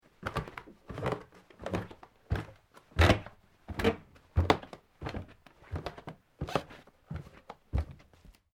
FOOTSTEPS SOCKS STAIRS WOODEN SQUEAKY DOWN SEQUENCE; Footstep Foley; Footsteps (Sound Effects:Human)